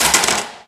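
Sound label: physics clipboard impact medium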